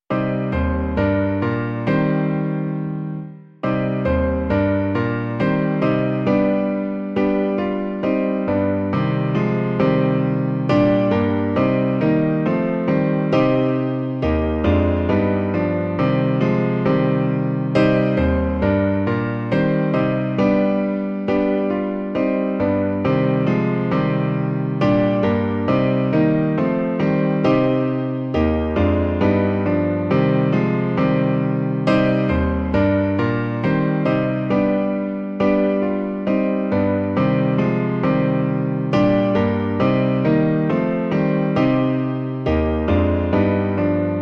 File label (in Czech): klavír; Klavírní verze